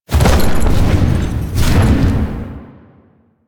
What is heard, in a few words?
Horse Glide Start; Vehicles; Duviri